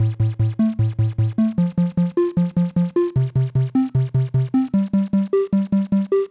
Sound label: stem bass, music loop; arcade